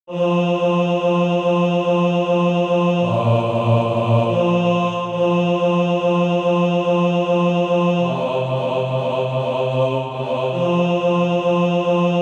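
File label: bass, Practise Music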